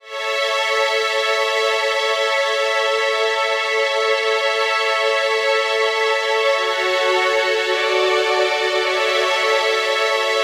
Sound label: verse strings